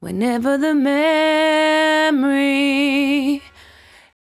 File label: 74-5bpm B, Velvet Vocal; While U Could; Zero-G